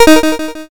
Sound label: cancel